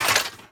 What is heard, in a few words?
metal